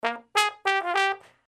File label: brass loop